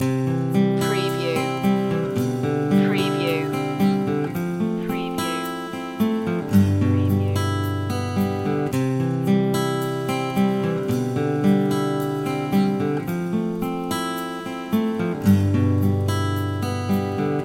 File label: acoustic ocean loop